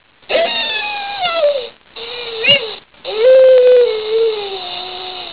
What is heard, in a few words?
cayote long howl